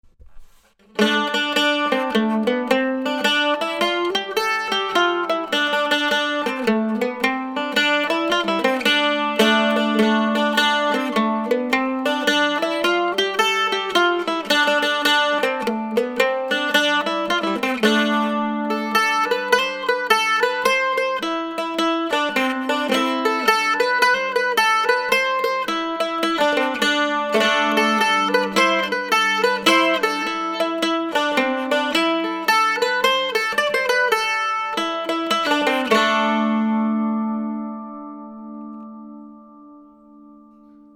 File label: mandolin